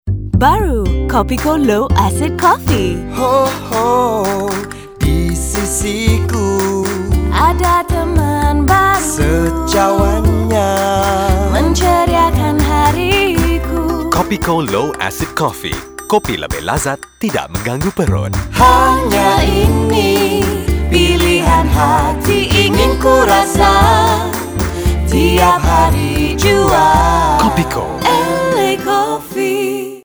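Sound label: Kopiko Malay Jingle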